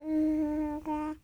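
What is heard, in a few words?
TALK, baby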